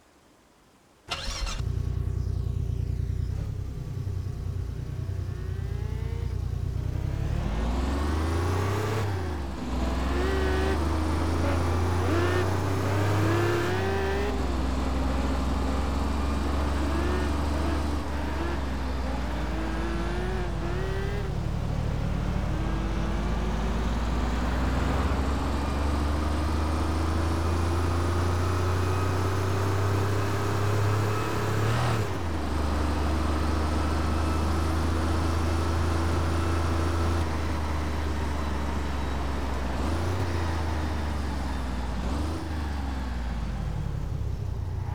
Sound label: mercedes-benz e 420 w210; TDU-1